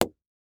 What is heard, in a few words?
Sports Baseball Bounce On Cement